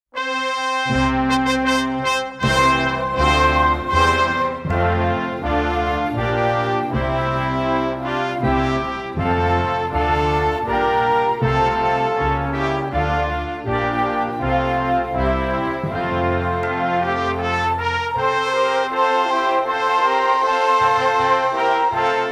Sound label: America,recorder; recorder karate